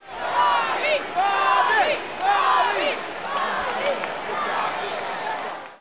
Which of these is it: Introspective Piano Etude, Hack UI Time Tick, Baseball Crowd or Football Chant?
Baseball Crowd